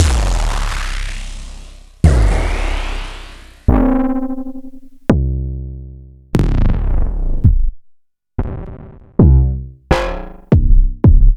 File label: Kick c